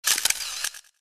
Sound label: shutter; McK v3 long trail